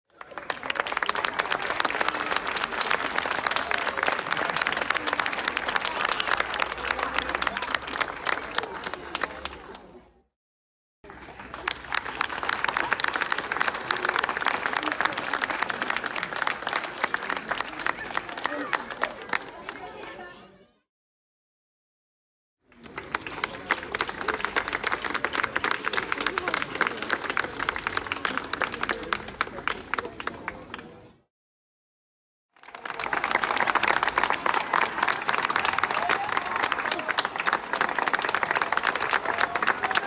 aplodismenty na ulice

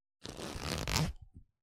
molniya shtanov

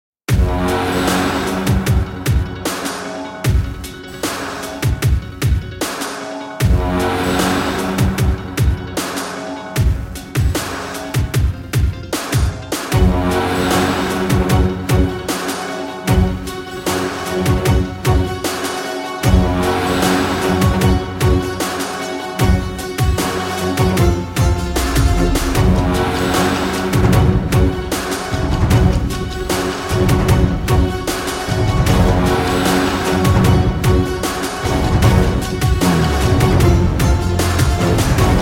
krutaya muzyka dlya vashyh rolikov

